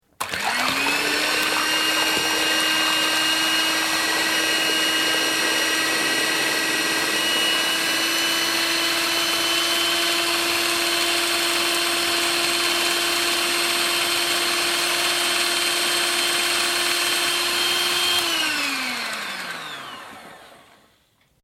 Food Blender, Hand held food processor, electric food mixer, mixing up an egg; Appliance Sound Effects